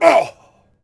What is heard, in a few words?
hit vox; Civ4 warlords